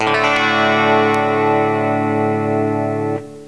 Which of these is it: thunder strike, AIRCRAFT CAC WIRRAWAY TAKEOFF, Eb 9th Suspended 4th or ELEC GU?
ELEC GU